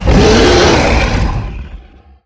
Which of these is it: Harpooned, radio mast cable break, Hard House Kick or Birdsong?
Harpooned